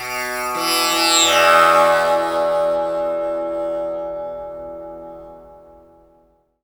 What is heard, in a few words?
TAMPURA; Spectrasonics; Heart of Asia